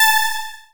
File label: SYN, SYNTHESIZER; DIGI WAVE #1